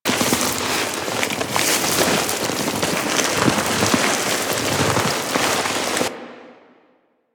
Kneeslide Loop Flesh

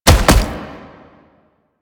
Gallop; Enemies; Steps; Proto Infested Brachiolyst; Warframe

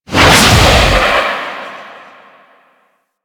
Air Lift; Ambience; Warframe; Grineer Galleon

